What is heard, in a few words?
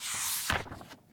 Book Page Turn; SFX Kit; Foley - Misc